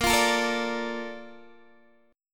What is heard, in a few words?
Bb Major 7th, Bb, down, Guitar, DADGAD